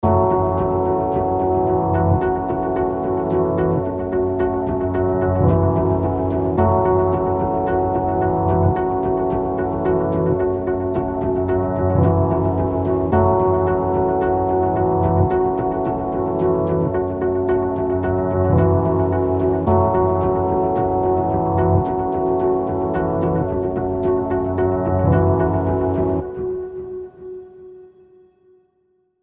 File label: Intrigue Pulse